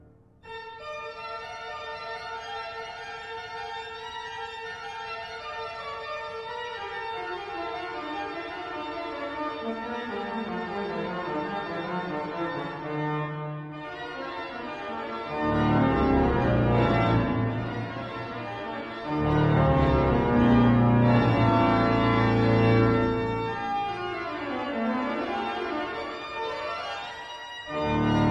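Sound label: Toccata and Fugue in D minor, organ; J S Bach; organ classics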